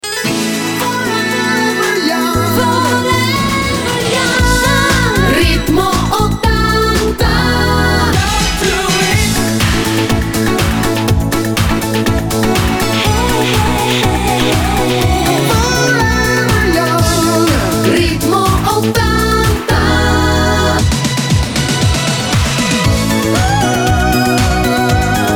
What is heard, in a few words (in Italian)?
ritmo 80